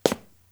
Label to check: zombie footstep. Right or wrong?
Right